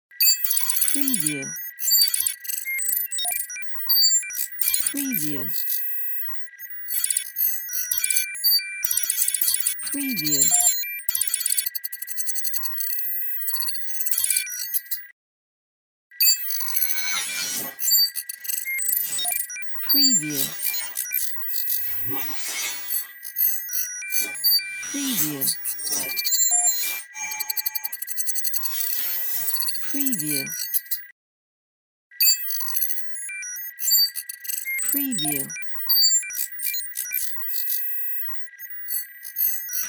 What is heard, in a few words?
hi tech cybernetic device